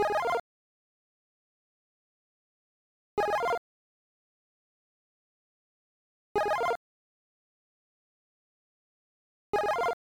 Powerup sound 8bit Mario Like

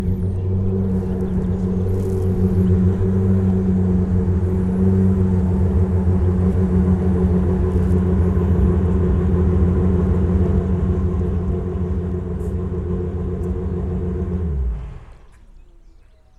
Porsche 911 Gt3 Cup Car Engine Ticking Over

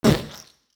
Vocal Splat

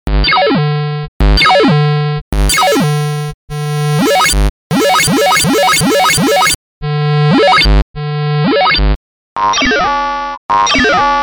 Like Annoying Computer Game Noises

